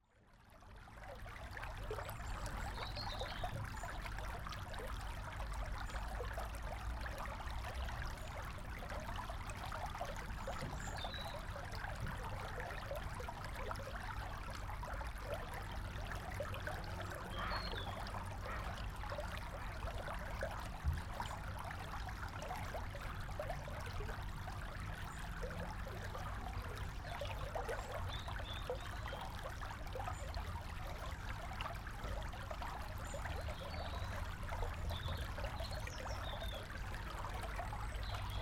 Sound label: River Pool; Bell Green